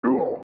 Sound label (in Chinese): atk shouji